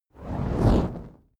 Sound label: fire whoosh torch med flange